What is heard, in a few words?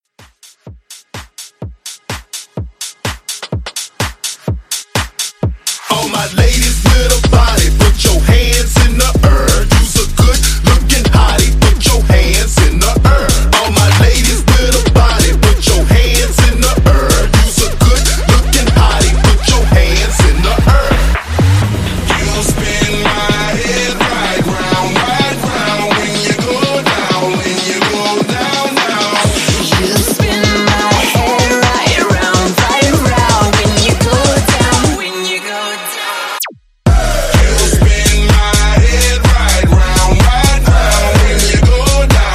Club Hype, 126; Gallardo